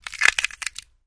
Celery Break Crunchy; gore stabs & eat

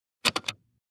1930 Radio Button; switches

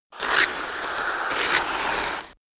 Hockey Player Skate

